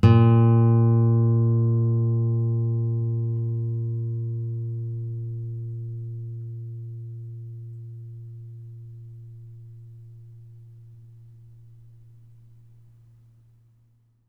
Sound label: bass, ostinato bass; 3 bass